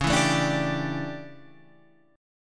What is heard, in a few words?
D Major 7th Suspended 2nd Suspended 4th